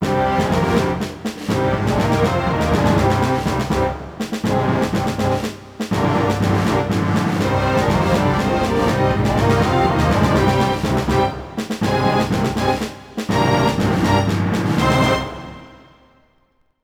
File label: Duel Music Win; sid meier s pirates 2004 pc